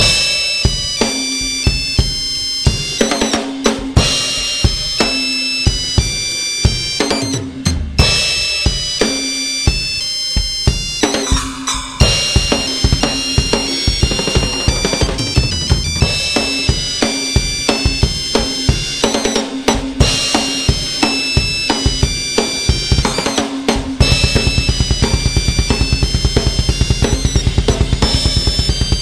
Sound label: Drums; Nokia